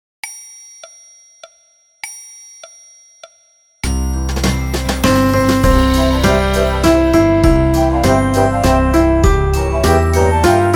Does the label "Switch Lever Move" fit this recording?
No